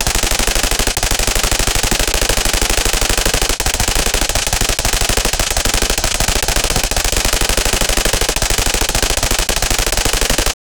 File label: machine gun firing in rapid succession